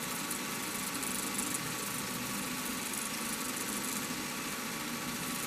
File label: server room data loop; Titanfall 2